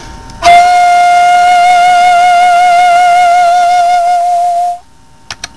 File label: kubifuri, shakuhachi